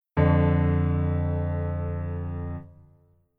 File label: Keyboard Voicing Basic